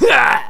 death; player

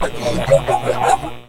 skelebot drown

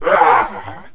death

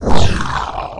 pain; player

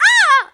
pain; player

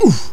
fall; player

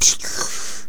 gasp; player

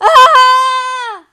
falling

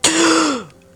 gasp; arachna

